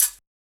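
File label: Hi-Hat Closed; Yamaha DJX-2B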